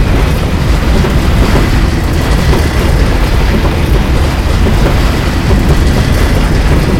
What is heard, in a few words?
Concrete Slide; DCS